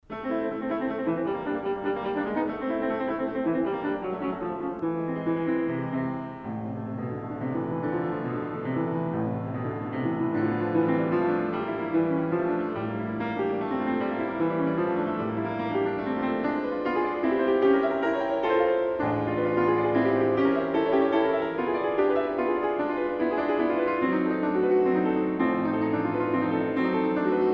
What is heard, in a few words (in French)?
Pour le Piano, piano; Debussy